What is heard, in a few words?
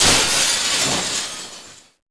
Crystal Tree Shatter; firestorm; Gameleap; mw4